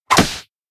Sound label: self slap; anemoi